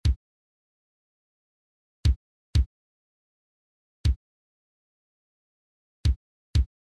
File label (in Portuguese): Toque - Surdo Mor; fanfarra